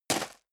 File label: step leather shoes gravel medium single right; schritte